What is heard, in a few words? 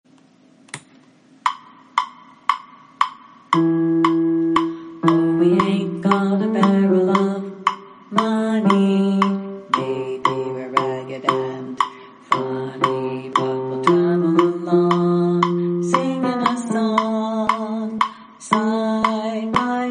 bass